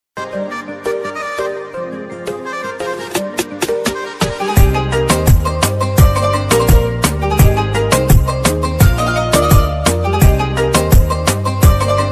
islamic ringtone instrumental ringtone arabic ringtone